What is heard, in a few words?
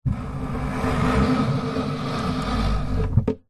household coffee table wood maple heavy legs slide wood floor slow